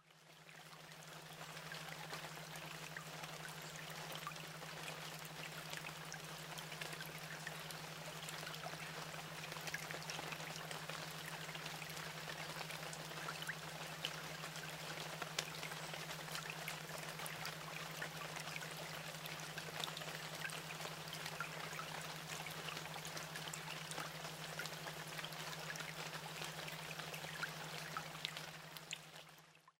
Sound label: binaural beats rain on foliage; sleep loop